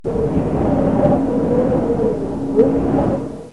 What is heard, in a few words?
blustery wind